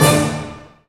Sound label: HIT; AKAI S-Series CD-ROM Sound Library VOL-8